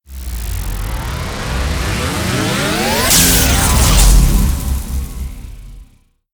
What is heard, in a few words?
Cathedral Beam Start